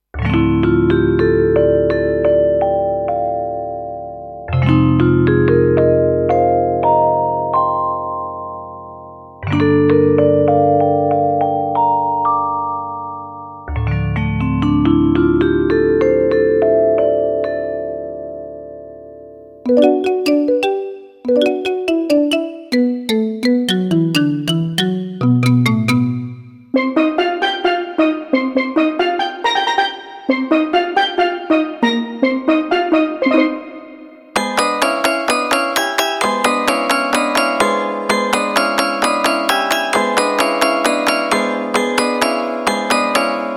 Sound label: Mallet; NI Kontakt